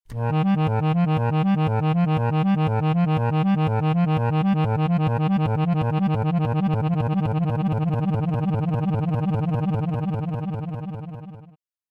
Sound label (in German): pattern sampler impossible speed